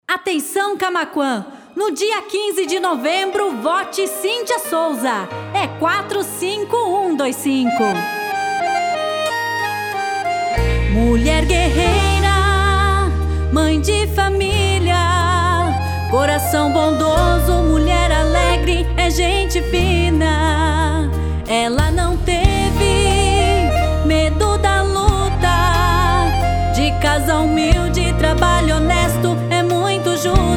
Jingle VEREADORA; Cintia Sousa; Camaqua; RENOVACAO

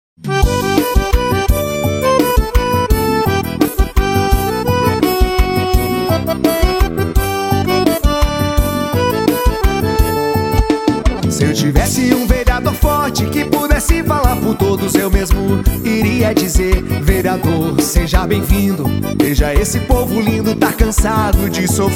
Jingle VEREADOR; Ele e a voz do povo; RENOVACAO

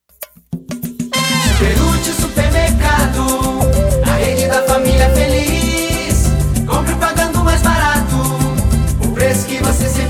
Jingle Supermercado; SuperUtil

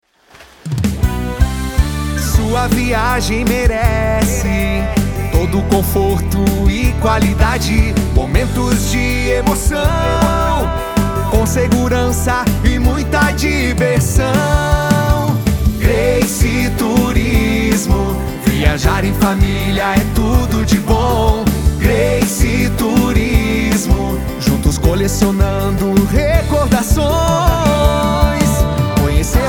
Jingle Turismo; Grace Turismo

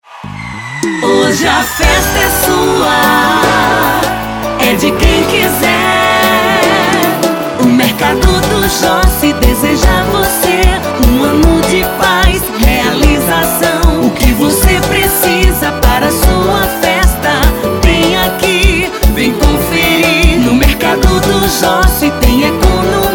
MERCADO JINGLE ANO NOVO; DO JOCI

